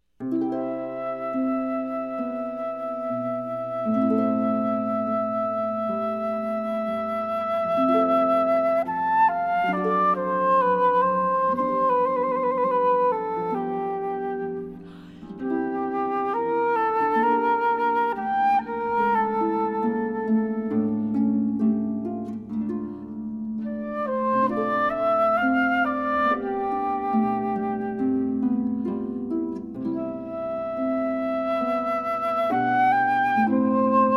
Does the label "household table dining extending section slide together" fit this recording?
No